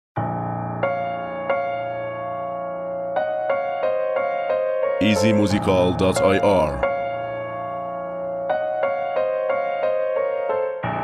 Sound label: Beat